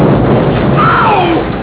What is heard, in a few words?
owwww